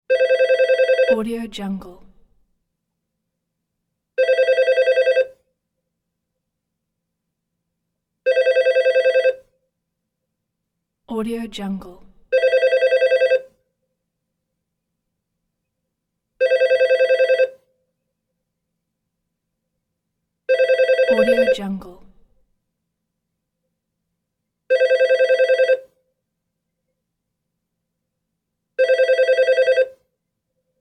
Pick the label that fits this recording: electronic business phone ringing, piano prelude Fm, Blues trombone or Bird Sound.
electronic business phone ringing